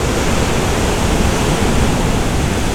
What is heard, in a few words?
wave